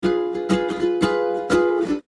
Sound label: instrument nukulele rhythm